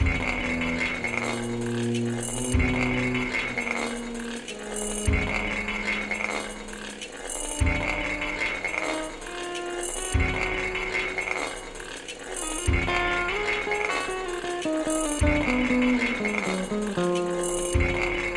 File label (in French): Frottements de Pierres